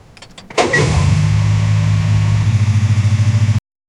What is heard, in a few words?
low rumble of a 1940s, engines